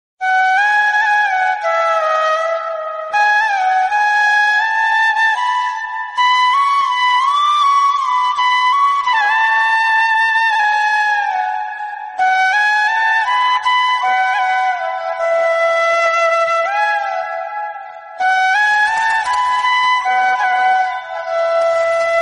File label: most beautiful piano iphone ringtone